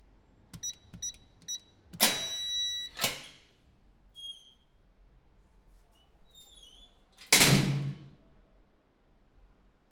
Street Building Front Door Code Beeps Door Open Close